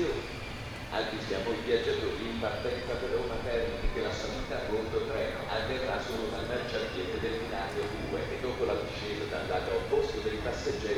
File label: train station, ambience